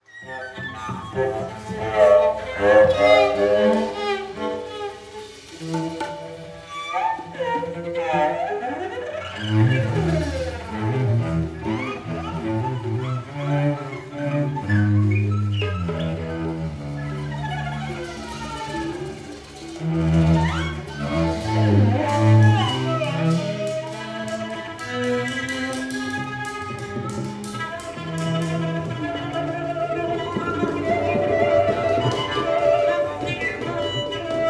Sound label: Opening Improvisation; Strait of Gibraltar Suite